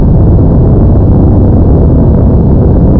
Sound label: move; svencoop; magnus